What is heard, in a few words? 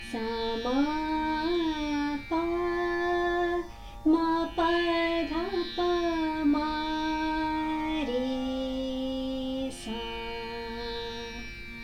Meend Kedar, Embellishment